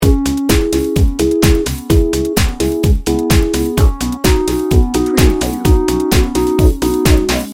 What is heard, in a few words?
electro dreams loop